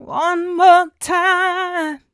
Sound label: f 126 mel f-sharp, fem Vocals